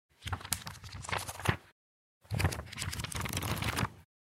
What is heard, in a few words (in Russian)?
zvuk perevorachivaniya stranic